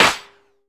rich top end snare A key, steel snare drum sound tuned to the A note